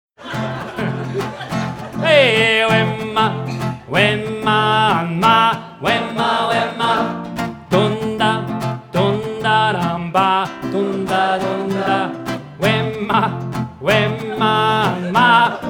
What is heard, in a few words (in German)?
suaheli gesang